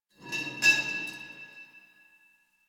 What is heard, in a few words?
Pipe Cement Scrape; Warframe; Ambience; Nineteen Ninety Nine